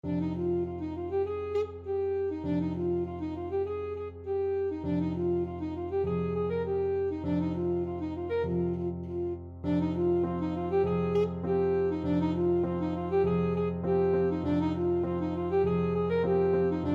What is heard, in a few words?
ASAX, saxophone